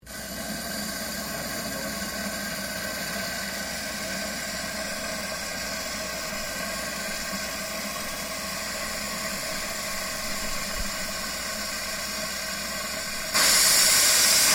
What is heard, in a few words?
STEAM VALVE RELEASE, Sounds onboard HMAS Castlemaine; Boat Sound Effects; Boats (Sound Effects:Vehicles)